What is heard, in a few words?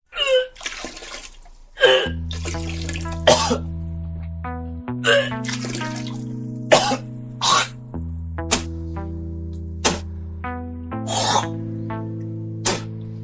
puke